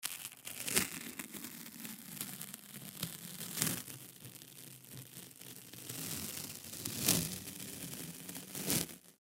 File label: Duct Tape Peel Off Surface Slow Rip; Offices; Foley; Blastwave